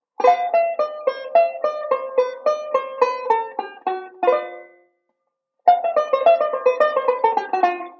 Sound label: ii V Pattern, banjo